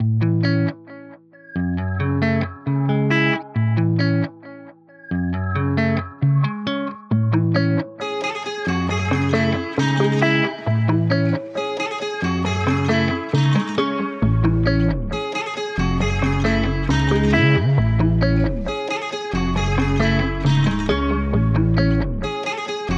135 BPM C# Min, Guitar; Mania; Cymatics; Miami Guitar Pack - Pt 2

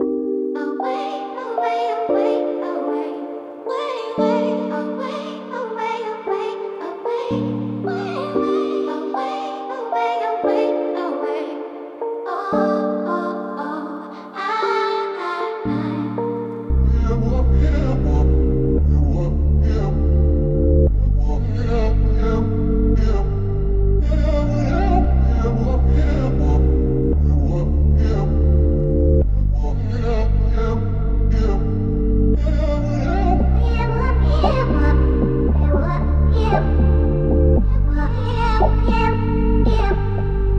115 BPM G Min, Melodies Reverse; Cymatics; ZODIAC Beta Pack

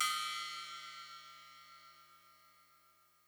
Crash China; Percussion; Cymatics - Infinity Beta Pack 2.0; Zeus Percussion Collection

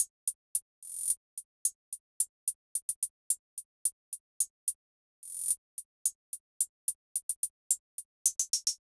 Hihat Loop - 109 BPM, RnB; Karma

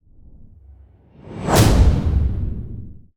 Whoosh Hit Atomisation; Whooshes; Ghosthack

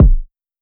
Smooth Water Kick - C; Lofi

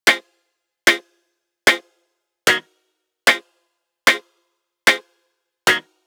Dubstep Chord Loop 150 BPM F Min; Chord Loops; Synths; Cymatics; Dubstep Starter Pack

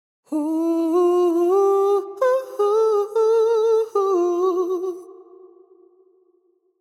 Euphoria Ad Lib Wet - 95 BPM F Maj; Cymatics; Vocal Sample Pack; Ad Libs

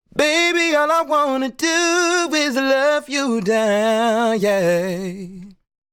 Sung Phrase Dry, 95 BPM F Maj; Baby All I Want; Cymatics; Euphoria Vocal Sample Pack

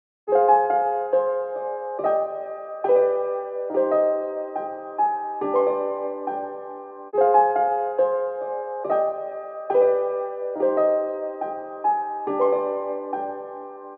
140 BPM C Min Piano, loop stems; Melody; Cymatics; 2022 Melody Collection Platinum Expansion; Bloodborne

